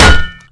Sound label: sentry bombball hit